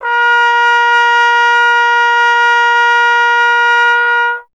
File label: Trombone A#; Miroslav Vitous - Solo Instruments VOL-1